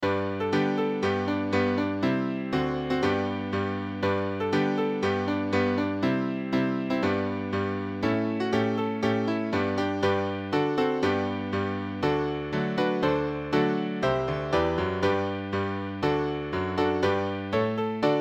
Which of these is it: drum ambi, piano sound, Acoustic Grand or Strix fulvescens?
piano sound